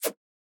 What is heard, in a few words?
Dash; boomerang fu